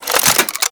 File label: Rifle Reload; Warframe; Bolto